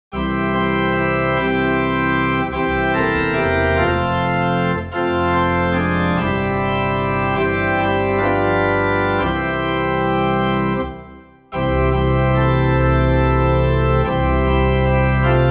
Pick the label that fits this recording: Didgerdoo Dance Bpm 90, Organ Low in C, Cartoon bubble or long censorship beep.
Organ Low in C